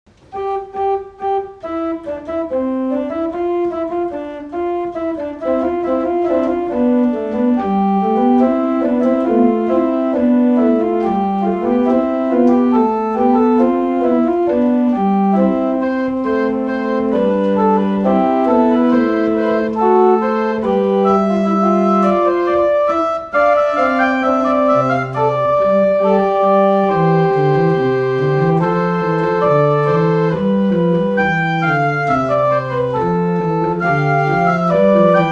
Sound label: organ; Irton